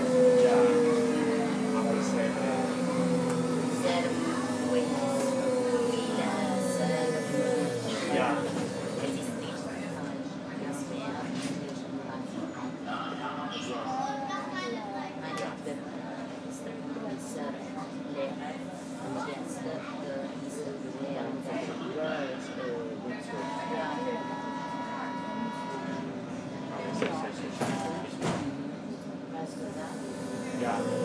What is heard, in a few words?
60 seconds of berlin u bahn u8 ambience